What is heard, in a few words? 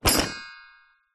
leisure toy musical xylophone plastic drop